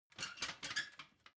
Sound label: ladder metal handling soft